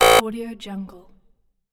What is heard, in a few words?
wrong